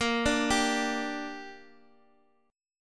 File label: A sharp 6th; arpeggiated; JGuitar